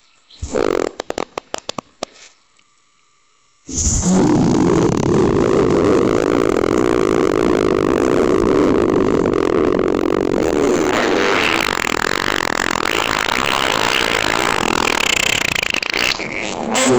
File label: loud audible bubbly long